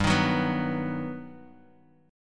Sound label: G Augmented Major 7th; G+M7 strummed; JGuitar